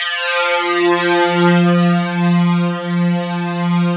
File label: SYN JX, SYN Analog; Sound & Vision; Gigapack I CD 2